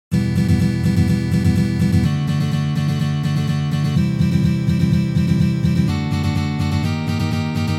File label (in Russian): boj